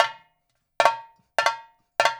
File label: fill, percussion; InVision Interactive; GRUNT